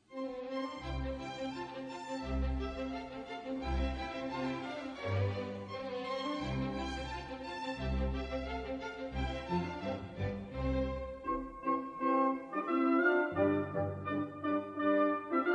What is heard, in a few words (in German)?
Klavierkonzert C-Dur Allegretto; Nr.25